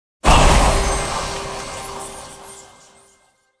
bonus hit; netent

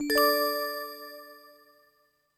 bling; netent

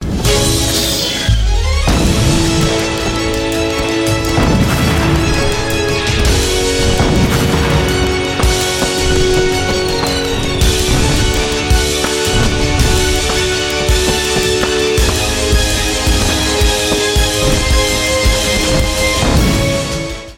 Big Win New

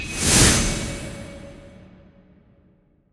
spirit Charge

